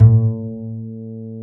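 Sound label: double bass A2, Jazzistic; Univers Sons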